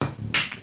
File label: pool